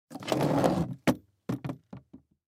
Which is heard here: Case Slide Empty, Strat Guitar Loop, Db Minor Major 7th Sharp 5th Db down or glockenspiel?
Case Slide Empty